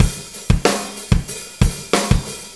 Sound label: beat